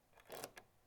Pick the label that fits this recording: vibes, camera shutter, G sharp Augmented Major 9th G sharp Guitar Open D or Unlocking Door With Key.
Unlocking Door With Key